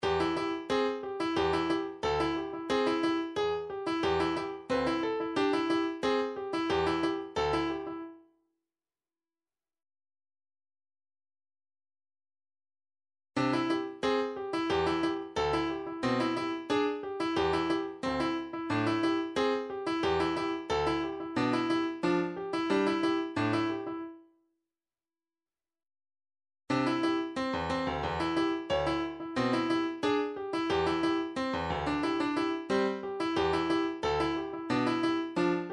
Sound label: midifiles middle of the road 90 bpm